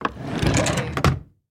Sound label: Drawer Close Kitchen; Sound Effects Bible